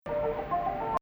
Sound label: Nahawand, maqam